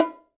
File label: HI BONGO MUFFL; Sonic Reality; Interactive Drum Kits